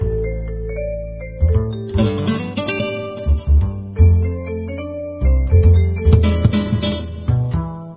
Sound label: Mexican Music; Diner Dash 2 Restaurant Rescue